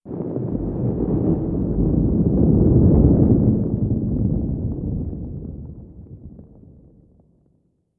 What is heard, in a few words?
earthquake rumble; sub earthquake rumble; Modern Warfare